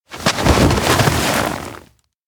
snow support; anemoi

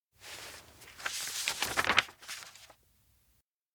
note open; anemoi

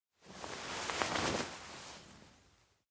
getup grumpy; rikka; anemoi パッケージ版 1.1

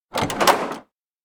door adult house open; aino; anemoi

